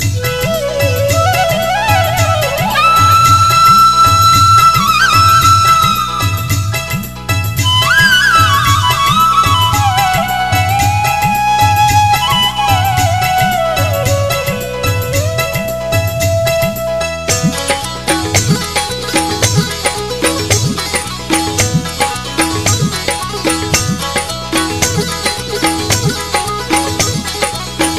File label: Balochi Tone